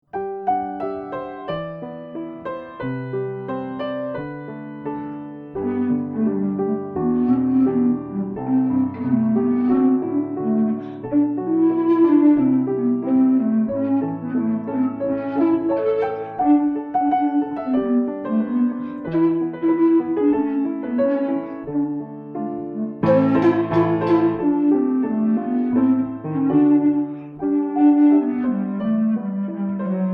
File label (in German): Bassblockflöte mit Klavierbegleitung